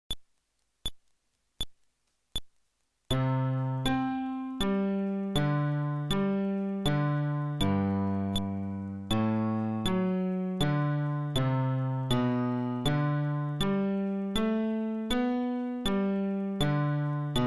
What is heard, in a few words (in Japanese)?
フレットレス (テンポ80)